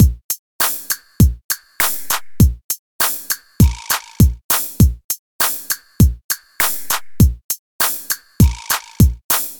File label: Slow N Ditty; Beats